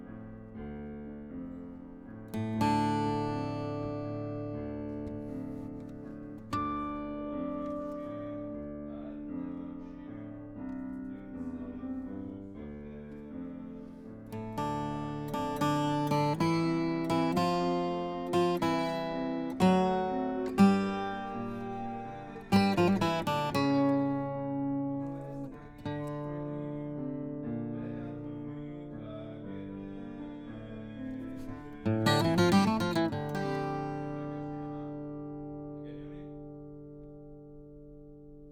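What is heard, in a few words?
guitar B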